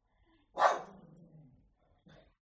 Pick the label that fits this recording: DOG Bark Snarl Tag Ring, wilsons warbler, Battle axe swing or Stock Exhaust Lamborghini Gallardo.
DOG Bark Snarl Tag Ring